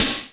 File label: Snare, Korg Wavestation